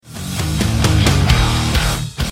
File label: Rock Tone